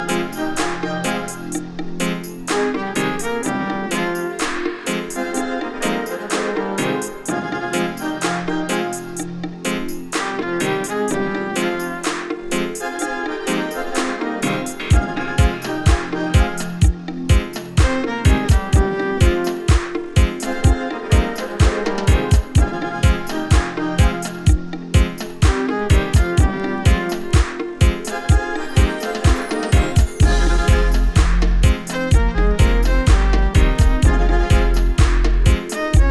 violins

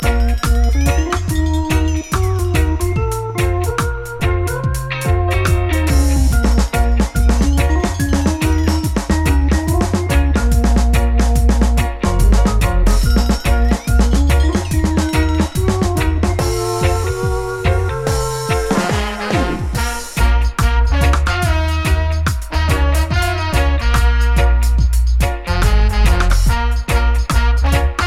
brass

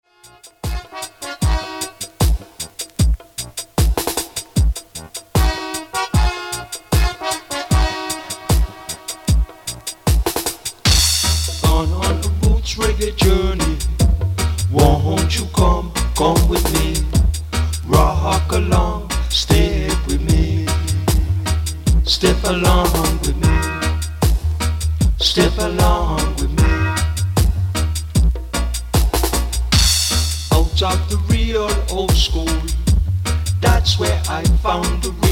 roots reggae journey

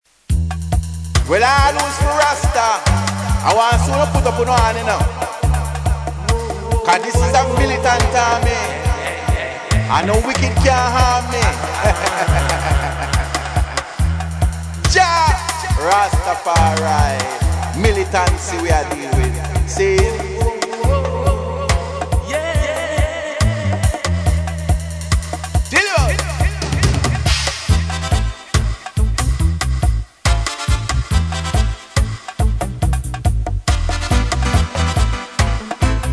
dub

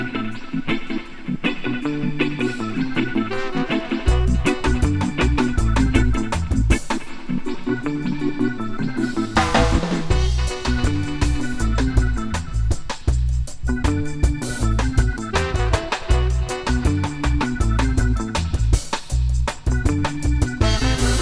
dub horns

